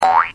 rabbit jump; mob

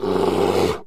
bear grunt; mob

